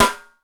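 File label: dry snare, dry kits; Crypton; DrumBase5000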